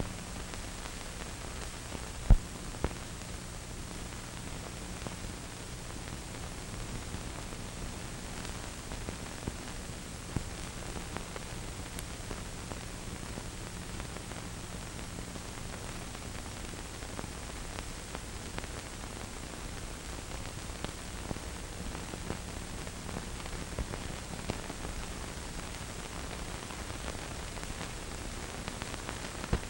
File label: line noise, Vinyl